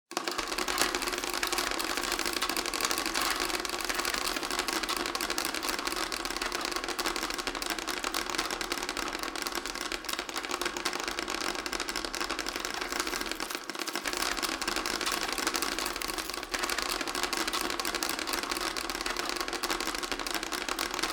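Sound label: Scarab beetles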